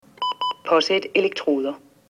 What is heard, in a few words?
Elektro